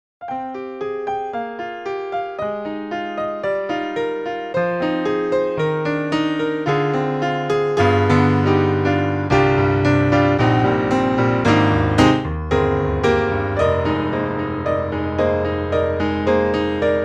grand3 C7 Close, piano

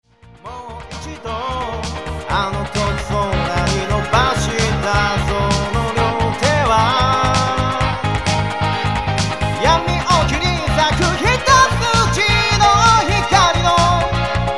vocal M

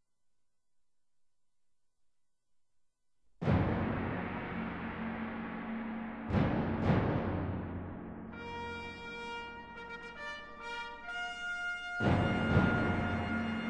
fanfan

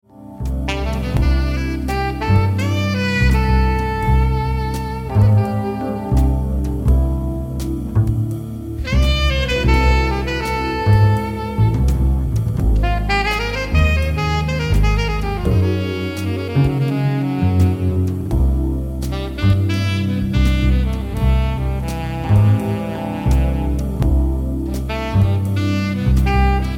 Song Alto; Take Gottsu

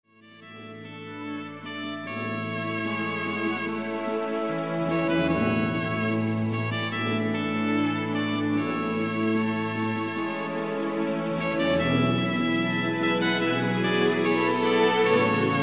orchestra

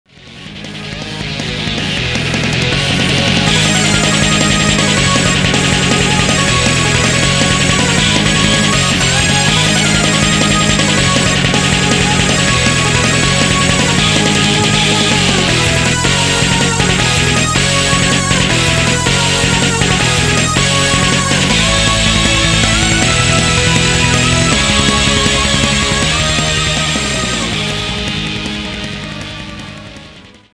lullaby

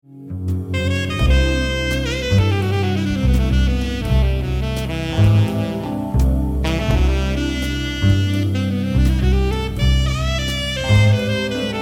Song Alto, Metal

